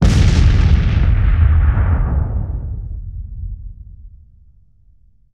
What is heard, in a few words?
Bomb detonation near